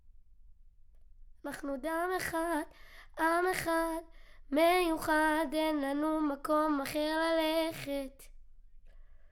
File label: Children Choir; Studio Bekol Children Choir